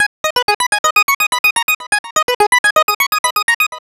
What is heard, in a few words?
little rock Ab 125, synth loop; Zero-G; Soundclash; Nu Dance Electro